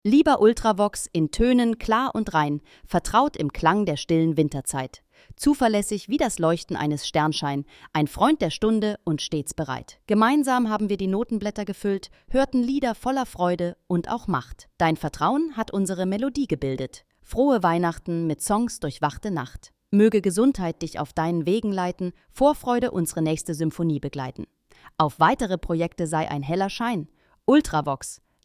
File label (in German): Magische Weihnachtsklaenge